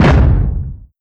step detail, strider; creatures; Half Life Alyx

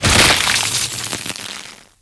host emerge, creatures, headcrab reviver

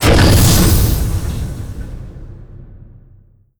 stop, waste pit bridge

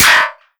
npc charger shield, bullet impacts; physics; Half Life Model Pack

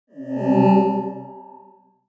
ui multitool toner success; player; Half Life Model Pack

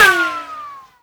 ricochet, bullet impacts; Half Life Model Pack

